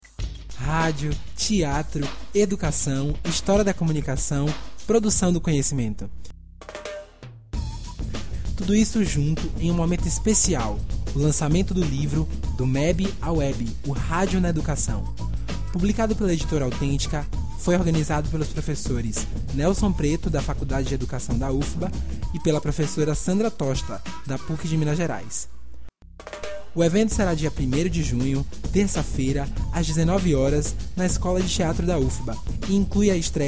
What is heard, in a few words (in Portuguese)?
vinheta, RadioFACED PodCasting